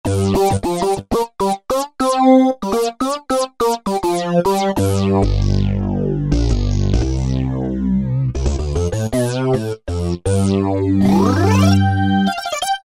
Lead Sync Lead, E-mu Morpheus; Factory Presets

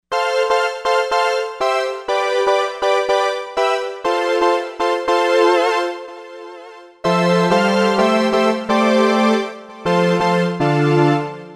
JUNO Strings

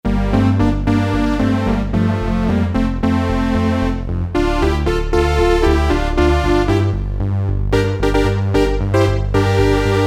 Korg DSS-1 Strings

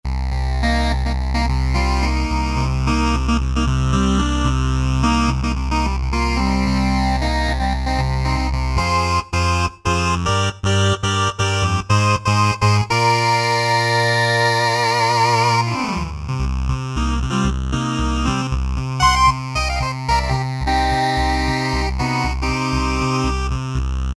Phase Saw; Roland SC-880; Factory Patches

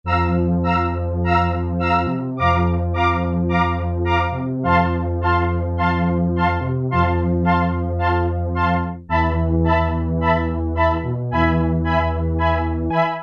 Hi(gh) Strings, Korg PolySix; Factory Presets Bank A

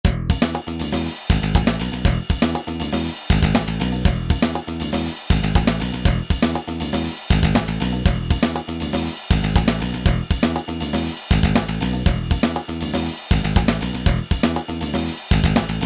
Fusion

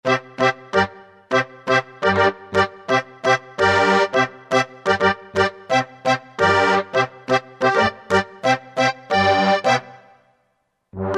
Accordion; Roland SR-JV80-18 World Collection Latin

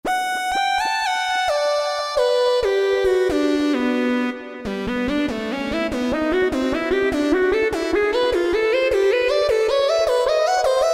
Porta Syn Lead; Roland SRX-05 Supreme Dance

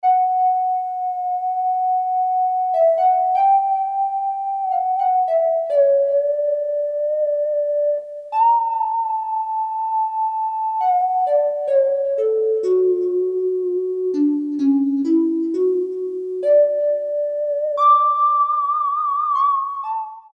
Midi Sine, MIDI Combos, Korg DSS-1

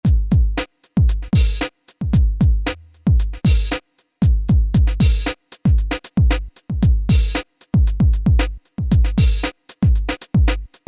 FUNK MIX, Grooves examples; Generalmusic Equinox

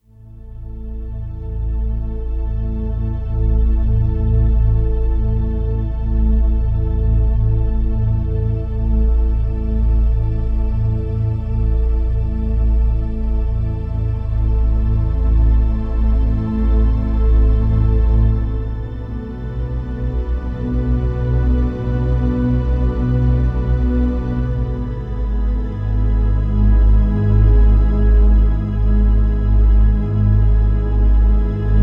Kurzweil K2500X; Factory Programs

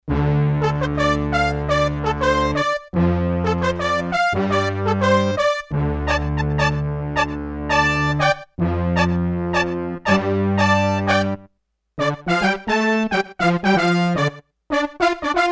Roland D-10 Bright Brass; Factory Patches

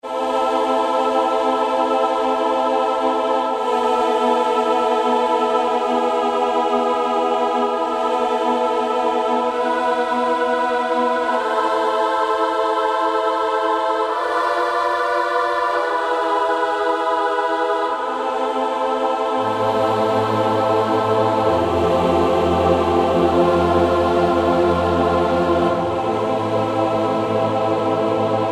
Choir, Korg N364; Factory Prog demos

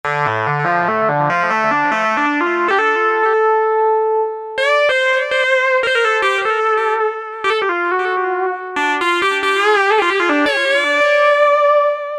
Operator Ld, synth

